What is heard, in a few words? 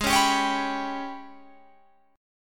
G sharp Minor Major 7th Double Flat 5th